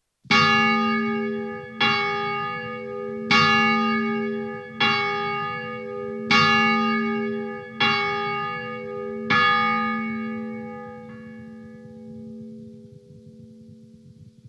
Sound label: Bell Ring; Bellmaster